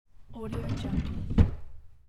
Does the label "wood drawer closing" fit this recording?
Yes